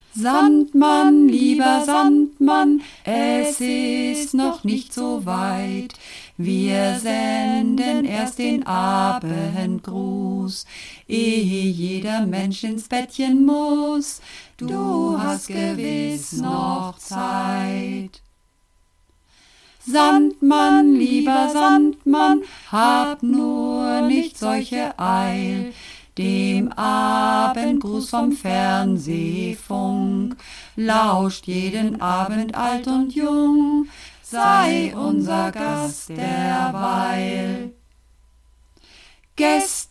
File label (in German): Mehrstimmig, Uebungsaufnahmen